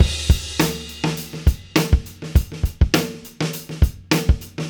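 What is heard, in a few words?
102 bpm, beat loops; Zero-G; Monster Beats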